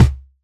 kick lofi; kicks

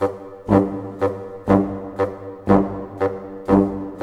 120 snare, army; East West; Scoring Tools; ORC

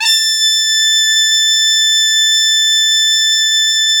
MIZMAR A4

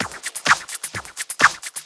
FX Loop 128 BPM, House

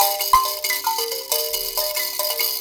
VIB KALIMP, VIB KALIM

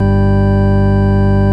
ORG VOX, Continental

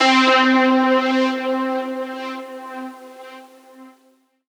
synth note C; Virtual Riot

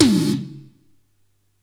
Gated Tom; Univerb; creative gate; Gated Reverb

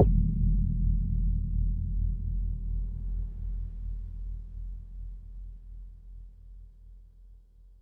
Bassdrum, Pearl SC-40

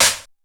TEK.P, TECHNO; 90 sSampleCDs; Crypton - DrumBase5000

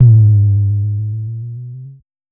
Drum X Tom; Pearl Drum-X

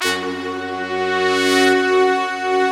big brass, Slow Big Brass

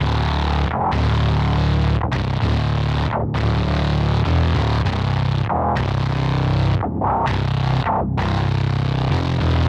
Synth Bass; Bass X

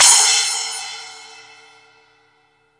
SPLASH; East Collexion; Drum 1 Dry

